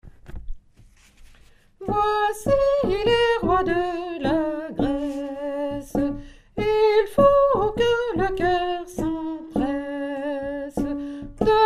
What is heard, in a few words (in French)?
tenor, chorale